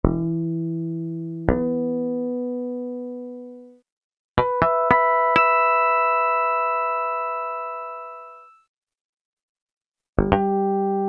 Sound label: Med. Harmonics, Peavey DPM Spectrum Bass